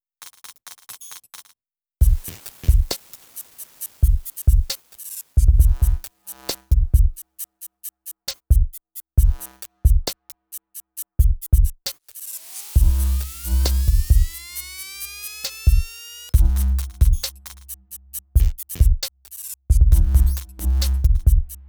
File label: Xenon Beat; aXLsound